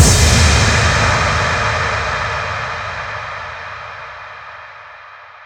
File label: impact; osu